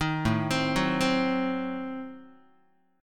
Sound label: A Diminished 7th A down Guitar